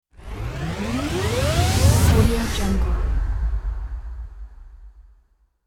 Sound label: cinematic riser